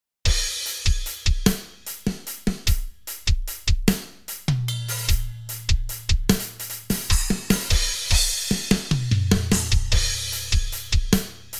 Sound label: Acoustic Drum Loop